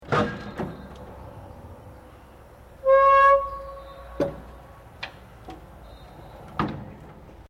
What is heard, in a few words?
LIFT HYDROLIC TRUCK LOWER; Various lifts operating; Construction Vehicles